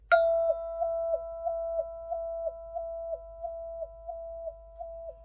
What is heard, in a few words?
one vibratone decay; Piano and Epiano